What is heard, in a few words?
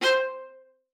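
C5, viola spiccato; Xsample VOL-5; 90 s Sample CDs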